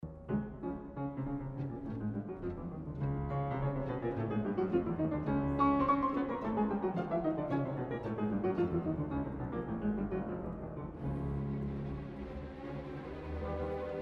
Piano Concerto No. 1 - Allegro animato; Lisztas; Koncertas forteppijonui ir orkestrui Nr. 1 ir Nr. 2

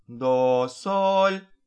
do-sol

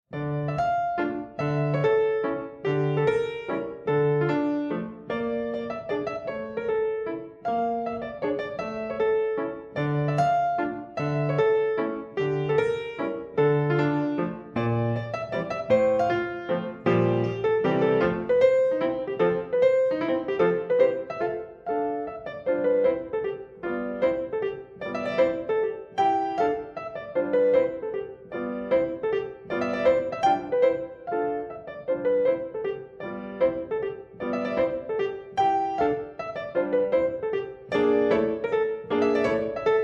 Mazurka

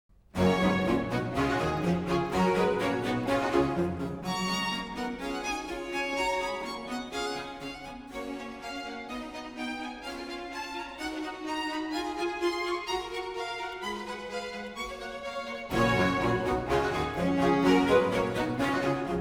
Concerto Grosso G-dur - Allegro

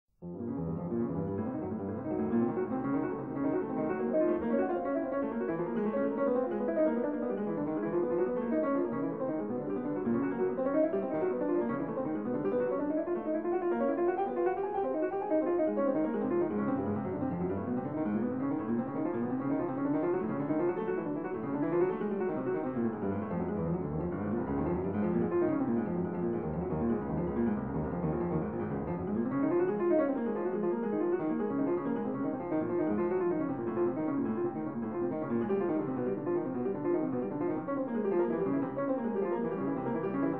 Sonata b-moll

